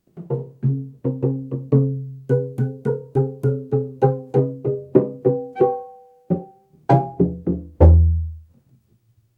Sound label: slow clumsy tuba music, out of tune